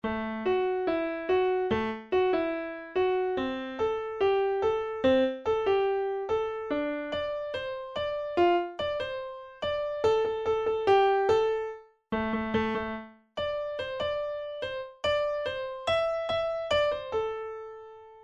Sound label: Piano Midi